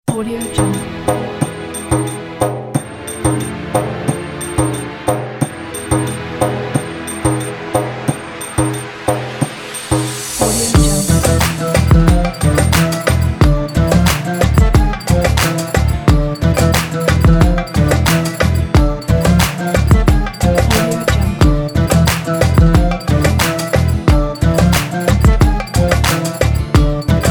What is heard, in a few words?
arabic; magicmood